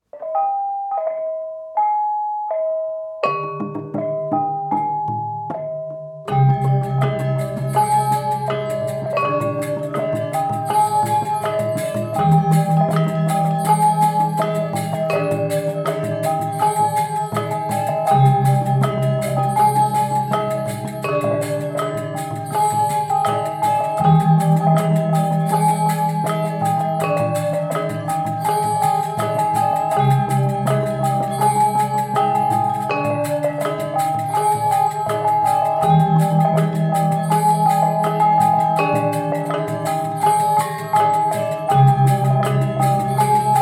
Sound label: Gamelan Slisir Bounce; Gamelan Bintang Tiga; Printemps du monde 2022